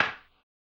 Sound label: HAMBONE, Vocal Blues Soul; Spectrasonics; Planet